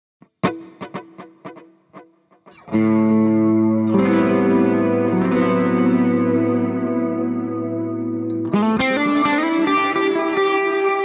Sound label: very wet